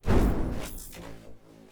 spell shock take; player

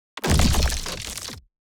successful build

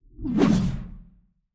morgenstern swing; player

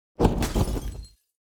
riot begin; Shelter

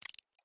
ui time control

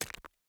environment pickaxe hit stone

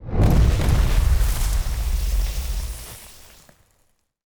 Box Rocket Explosion Big; TANK; 3Souls

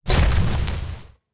Box Fire Break; TANK; 3Souls

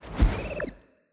ambience room secret room wisp deploy

